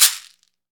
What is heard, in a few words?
CAXECERE, Latin Shakers